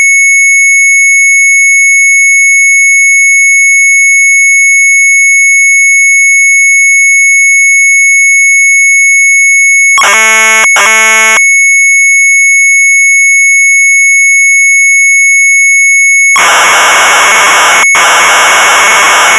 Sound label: MZ800 Tape; sharp; retromelsarchive